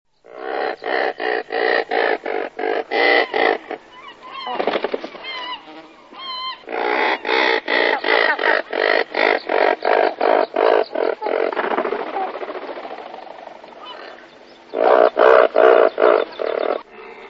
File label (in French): pingouin torda, oiseaux chant